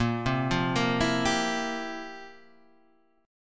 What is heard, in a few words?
F Major 7th Suspended 2nd Suspended 4th, A sharp, guitar